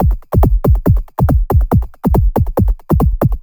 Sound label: LoFi Beats, 140 BPM